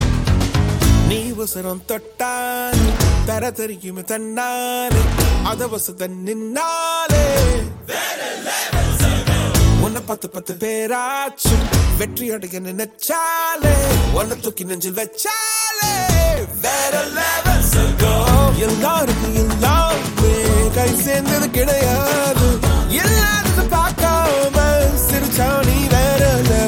Ringtone; Ayalaan

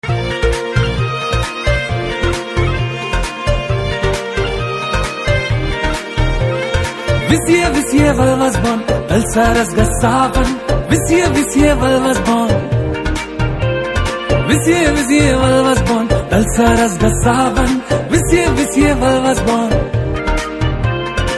Drum Beats

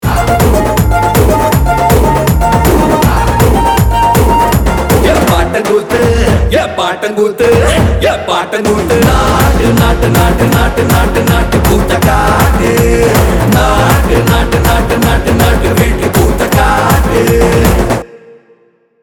Promo Ringtone

